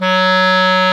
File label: CLAR, Clarinets; Roland LCDP04; 90 s Sample CDs; Clar Cls